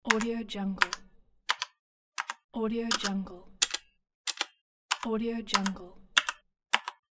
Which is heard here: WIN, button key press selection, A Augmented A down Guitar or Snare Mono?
button key press selection